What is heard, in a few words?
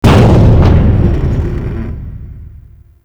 Ship Hit; Warframe